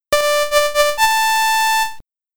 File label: Results SID Stereo, commodore 64; California Games